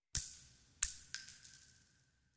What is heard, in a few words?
Shell Case Sngle Tube, shell casing movement